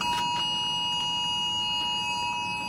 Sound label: tornado warning eas